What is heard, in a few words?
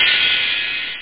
clap